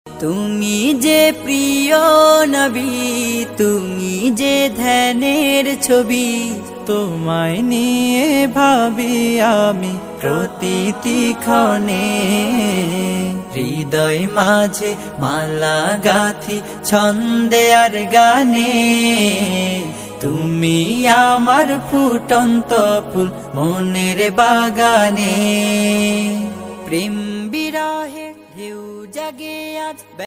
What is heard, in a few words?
ISLAMIC RINGTONE